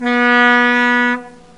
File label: Long Blast